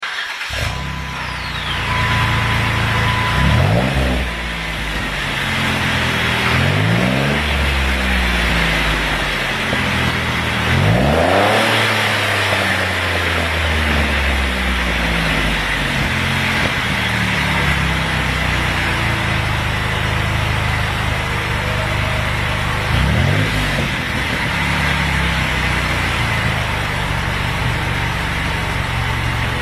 Wrangler 3.8 Dual Magnaflo, custom exhaust sounds

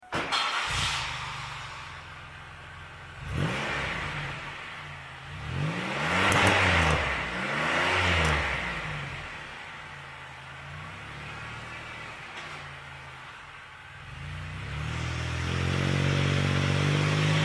Gran Prix GT 3.8L Magnaflows

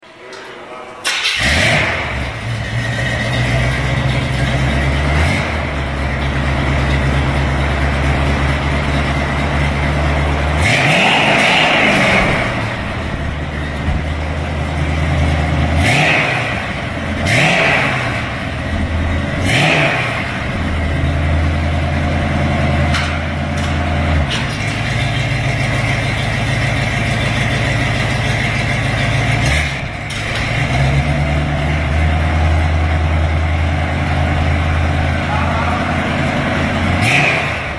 chevy 350, custom exhaust sounds; 82

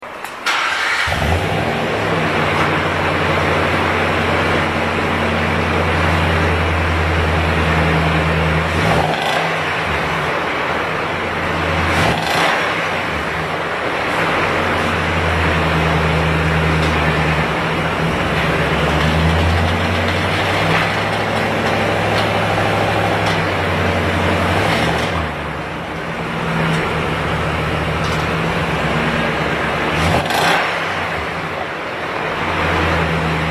Titan Super Turbo Duals, exhaust sounds

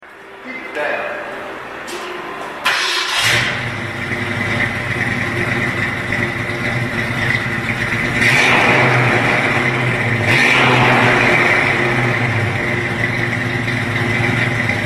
Mustang 289, exhaust sounds; 65

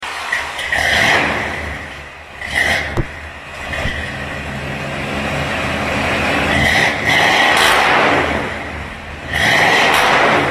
Cadillac DeVille 425, custom exhaust sounds

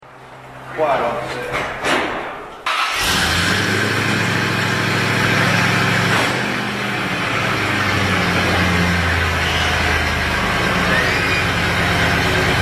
Blazer 350, custom exhaust sounds

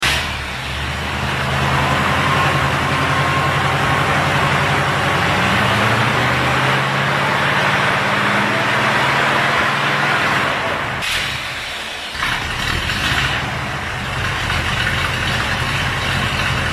71 chevy 350, exhaust

